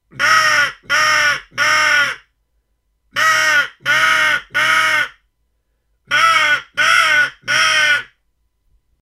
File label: game calls tripple; kraehenlocker und jagdlocker; Haydels